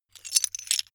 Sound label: metal pieces clatter short